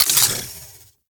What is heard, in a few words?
Modular Secondary Clip Mech; SU Modular Guns; Clips